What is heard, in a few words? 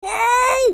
Crash Reversed Woah